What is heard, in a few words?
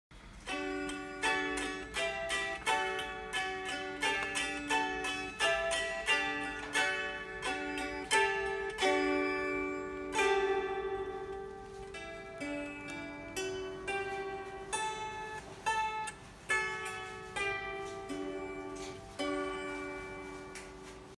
Kantele; کانتله